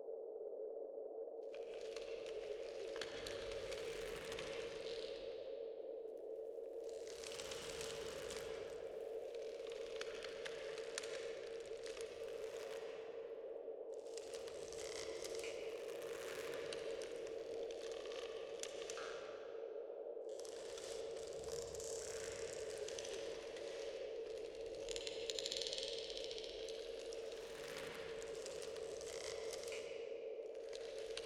atmos shellwood no wind; Hollow Knight: Silksong

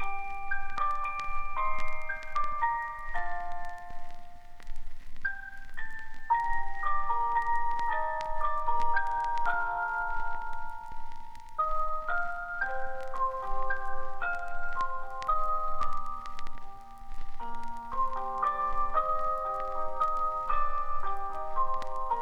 cylinder music box hang; Hollow Knight Silksong

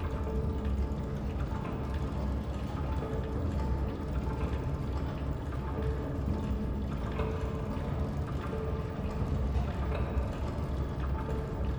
atmos cogwork core

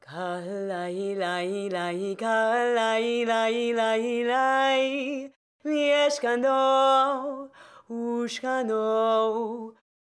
sing rite first loop

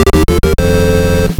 You Win!; 3D Classics Kirby's Adventure

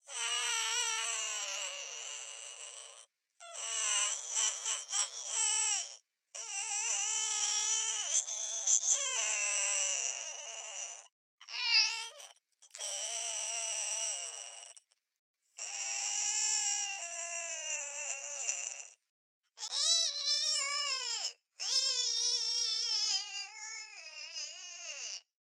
long winge

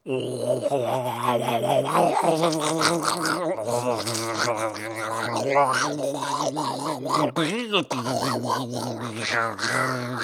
sing; SF sing 01

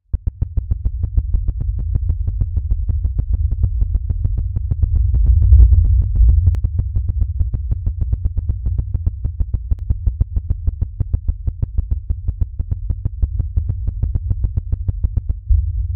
battle vibration

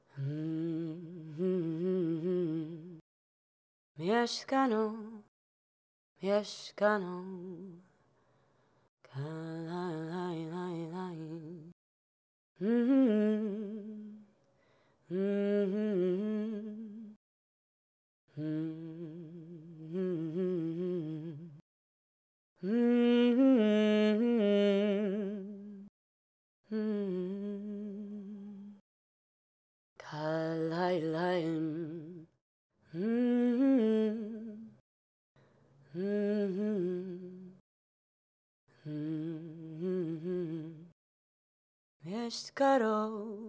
hum loop; Hollow Knight: Silksong; Shakra

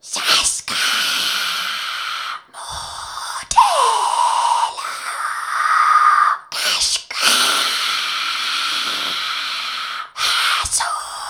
Huntress sing

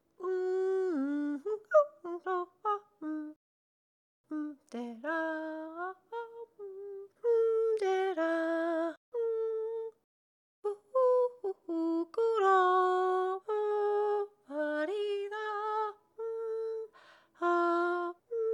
idle hum loop

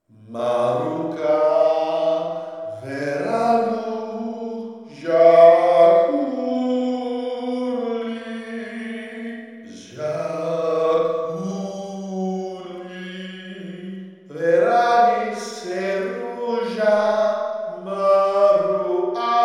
sing; CogD sing 02

